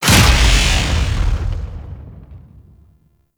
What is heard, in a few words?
Sentient Vessel Step, Enemies; Warframe; Tau; Movement